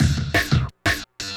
175 D&B Drums

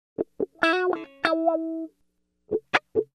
Pop Guitar Wah, C#m, Electric; Pop Rock

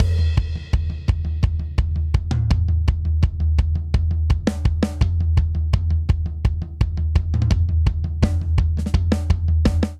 Straight Seven Rock Buildup 7/8 84 bpm, drum; Ableton; Factory Packs; Drum Booth

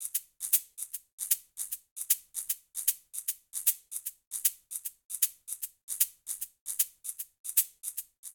Afro Jazz Shakers 115bpm; Latin Percussion; Ableton; Factory Packs

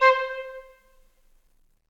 Piccolo Solo Staccato; Ableton; Orchestral Woodwinds

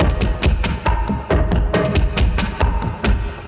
Foley & Real Drum Kit - 138 bpm, drums; MIDI Clips; Ableton; Mood Reel

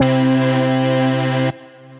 Drone, Synth Keys; Abyss Drone

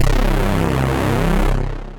Dixon Saw Bass; Synth

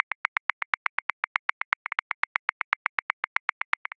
Linear Funk, Drum Booth, Grooves; Ableton

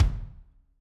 Kick, Drummer; Toontrack; Superior Drummer 3

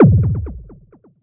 Bass, synth bass; Ableton; Factory Packs

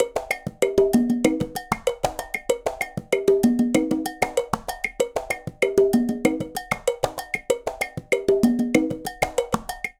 Songo 96bpm, Latin Percussion; Ableton; Factory Packs

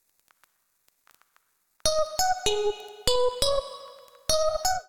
Mallet Synth G# Minor 98; MIDI Clips; Melodic; Ableton; Factory Packs; Chop and Swing

